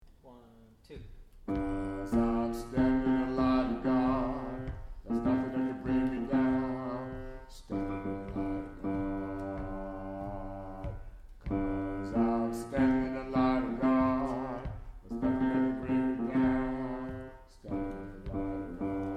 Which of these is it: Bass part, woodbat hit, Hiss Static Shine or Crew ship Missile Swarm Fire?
Bass part